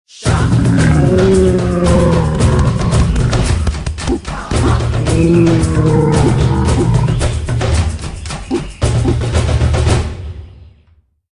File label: WIN SUPERHUGE; Gorilla